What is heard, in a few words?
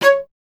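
strings cello C#; Miroslav Vitous; Solo Instruments VOL-1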